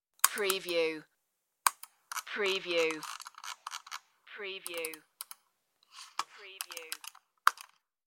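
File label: Computer Mouse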